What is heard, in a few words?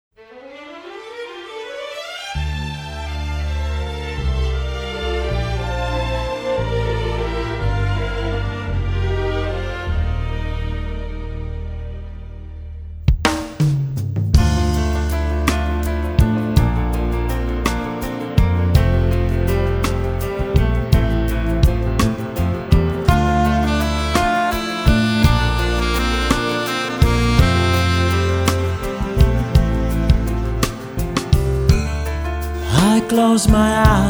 Rain